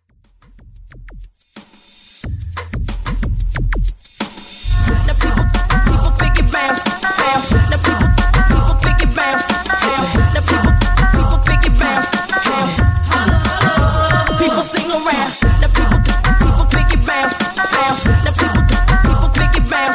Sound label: House Transition; OG To House